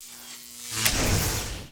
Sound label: power charge